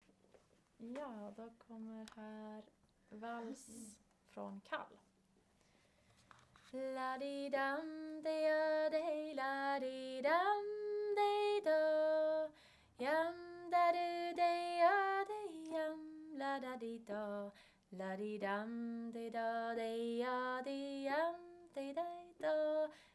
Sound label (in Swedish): Vals från Kall